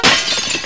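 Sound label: Brk glas, break glass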